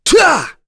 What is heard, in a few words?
Vox Attack; Baudouin; kingsraid; voices heroes